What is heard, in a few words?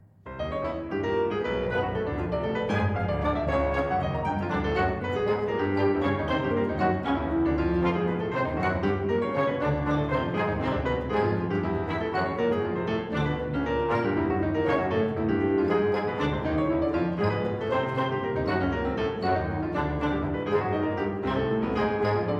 partita in c, esercizio, orchestra